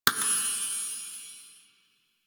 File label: Boon Rollover; Warframe; UI; Duviri